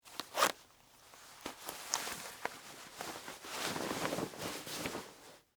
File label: Jeans Take Off, Foley, Clothes; Dressing; Human; Blast Wave